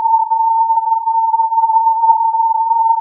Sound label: filtered input